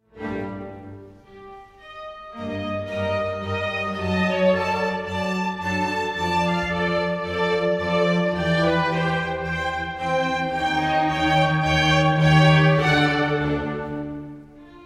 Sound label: symphony no 5 in B flat major; wolfgang amadeus mozart